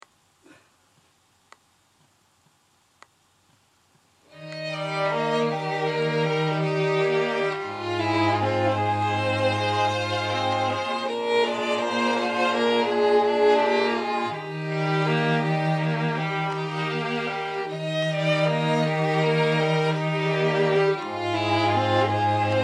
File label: Quartet, String Quartet; Beautiful Dreamer